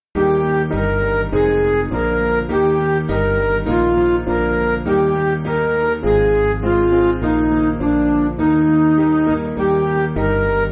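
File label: Music Backing Files for Churches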